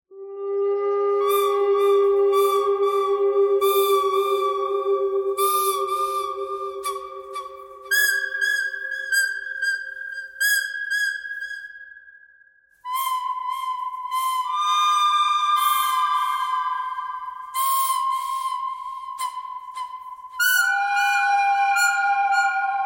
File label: bird canyon bird thread simple; birds